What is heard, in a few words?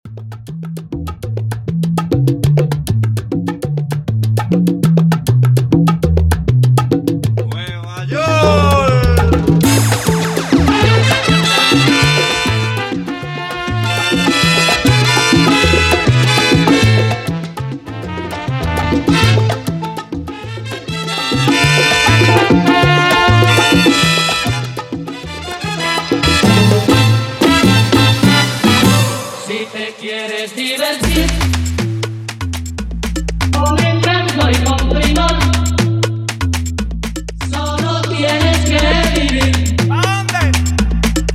Transition Salsa To Dembow 100-120 BPM